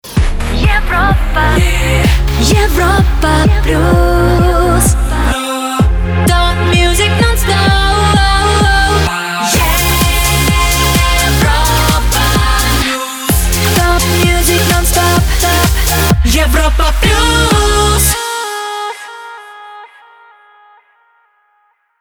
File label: Jingle Main ID; Europa Plus